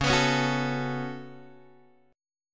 C sharp 7th Suspended 4th Sharp 5th C sharp, Guitar Open G